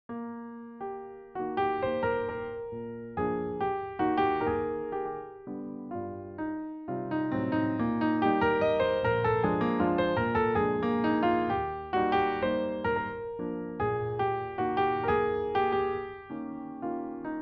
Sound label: Waltz, chopin